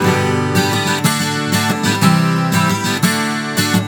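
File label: 120 G GUITAR, Celtic; USB Soundscan vol.42 - Celtic Flavours; AKAI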